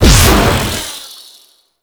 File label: Sentient Rifle Empty Fire Explosion; Warframe; New War